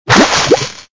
Grito

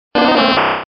Grito de Oddish

